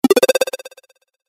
決定 低音 レトロ

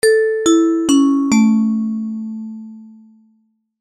お知らせ・終わり; 戏剧音效

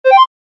場面切り替え 笛風

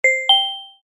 場面切り替え ベル

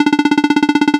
会話; nes retro talk sound effects

